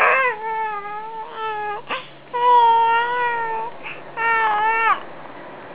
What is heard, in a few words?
sigh